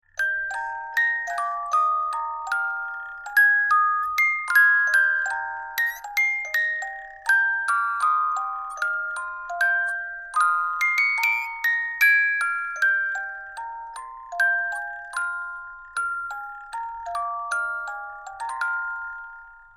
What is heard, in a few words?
musicbox